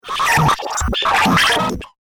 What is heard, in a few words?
Radioactive Radio Waves; science fiction sound effect